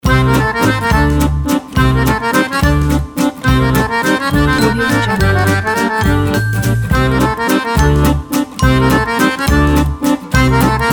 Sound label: summer accordion waltz